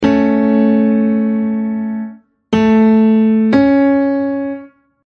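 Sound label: major third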